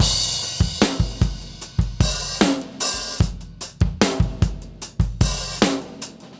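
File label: 75 bpm, beat loops; Zero-G; Monster Beats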